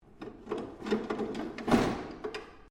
DUMPSTER METAL LID PLASTIC CLOSE, Large metal industrial dumpster; Factory Sound Effects; Factories (Sound Effects:Backgrounds)